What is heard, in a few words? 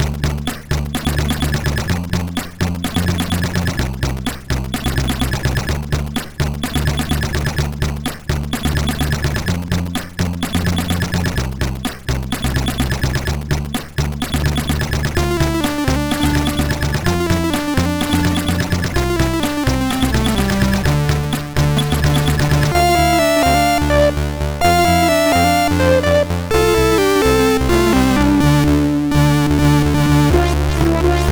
MOS6581R4, sid